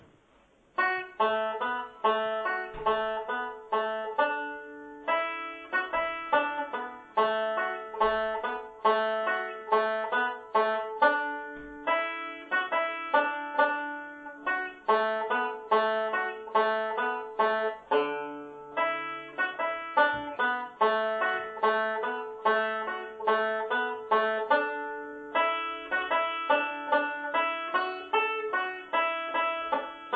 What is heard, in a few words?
Kerry Polka (D); Pegi Ryans